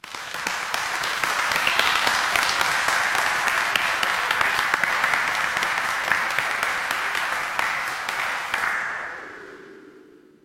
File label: crowd applause